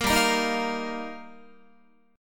G Minor 7th Sharp 5th, Guitar; Gm7#5; strummed; JGuitar

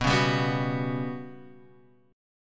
G Major 7th Flat 5th B

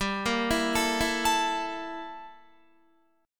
G Minor 6th Add 9th G down Guitar; Gm6add9; arpeggiated

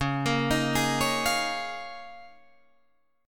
C sharp 6th Add 9th C sharp down Guitar Drop D

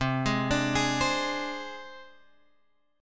C Suspended 2nd Sharp 5th C down Guitar Drop D

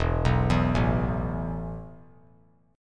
E Suspended 4th E, down, 3C,3F,3A,3D; Esus4 arpeggiated

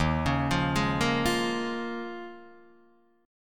D sharp 9th Suspended 4th D sharp, Guitar DADGAD

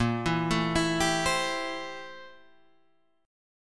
A Minor 7th Bb, Am7/Bb chord; Am7/Bb arpeggiated; JGuitar

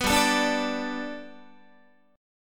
G Major Add 9th Guitar, chord; Gadd9 strummed; JGuitar

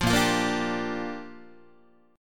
A sharp 6th, guitar chord; strummed; JGuitar

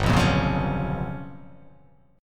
G 7th Sharp 9th Flat 5th

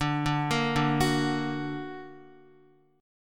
D Augmented D down Guitar; D+ arpeggiated; JGuitar

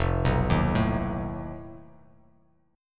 F 7th Flat 5th F Bass Standard; arpeggiated; chord; F7b5; 1,2,1,2; JGuitar

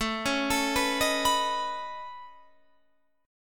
Db 7th Suspended 2nd Sharp 5th A, chord shape

